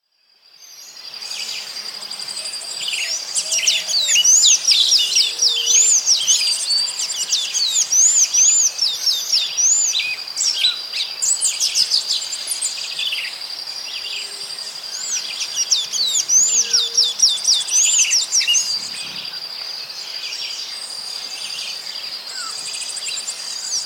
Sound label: hummingbirds in tropical rainforest; soundscapes